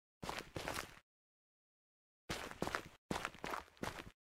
laufen; The Dream Machine

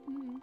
kommunikation; Spiritfarer

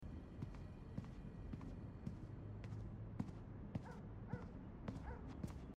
walking house teppichboden hintergrund; Life is Strange 2; gamesoundopedia

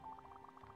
sprechblase; Spiritfarer

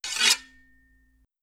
ziehen; mount blade

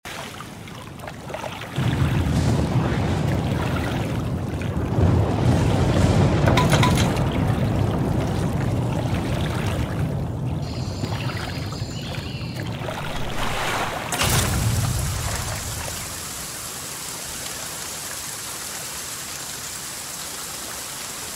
regen; The Flame in the Flood

